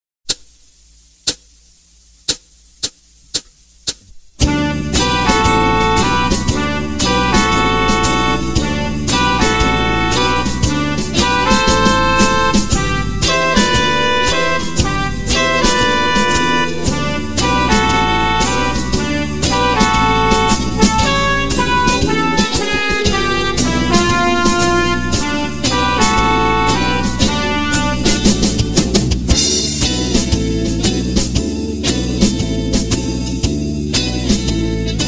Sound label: basic blues, tinwhistle